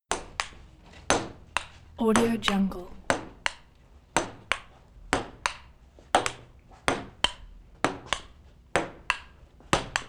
ping pong volley

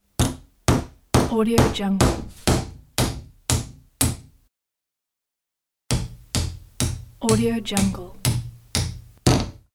hammering nail

